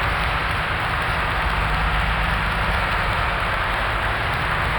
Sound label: Cracklature, sparse soundscape